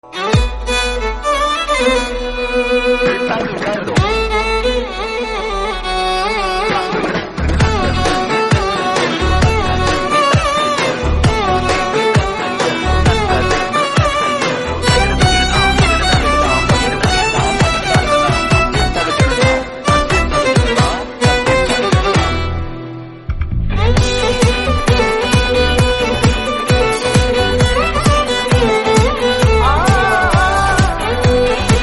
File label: Instrumental